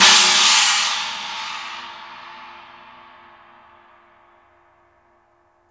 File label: PANG, cymbals; Sampleheads; New York City Drumworks VOL-1